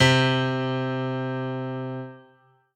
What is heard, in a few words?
ensoniq sq 2 piano c4